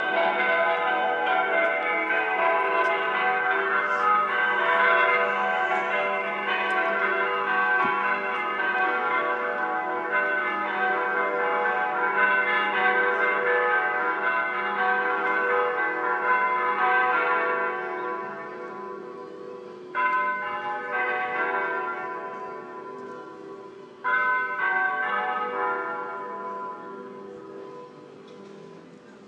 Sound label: bells